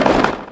acidus stand

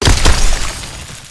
acidified zone water

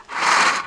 move; NPC SERPENT

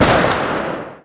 wild fire; night watch

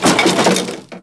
stone explosion

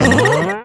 die; dispol

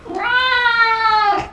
purring

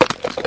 stone curse

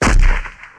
lava golem move

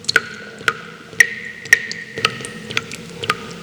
subterranean waterdrop

